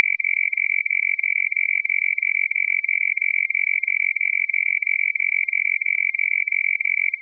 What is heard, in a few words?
fsk bpf short